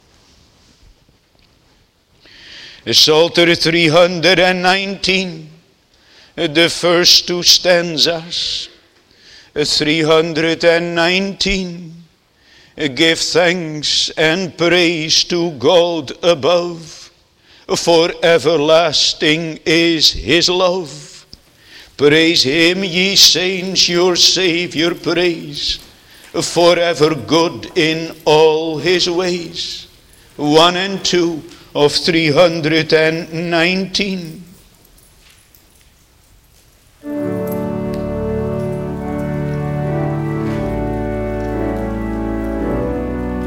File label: Singing; 1 john 3 1 3